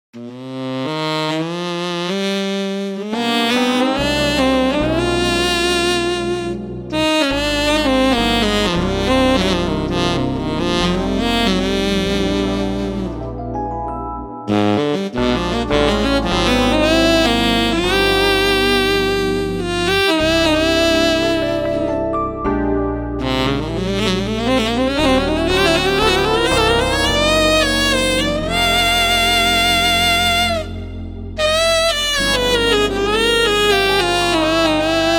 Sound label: tenor sax; Cadenza